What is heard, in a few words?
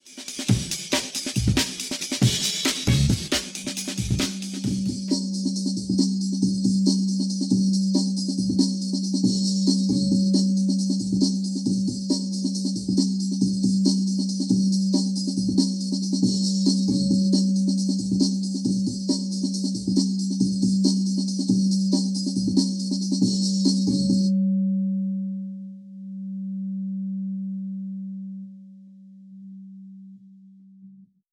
bowl dry Wet; schoeps